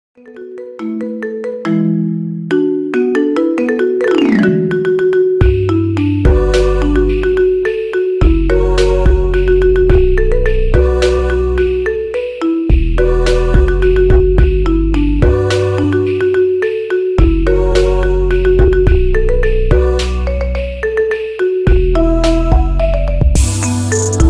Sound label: marimba ringtone remix